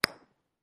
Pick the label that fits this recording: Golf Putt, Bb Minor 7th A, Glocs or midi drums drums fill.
Golf Putt